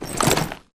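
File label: grunt movement foley loud medium; Titanfall 2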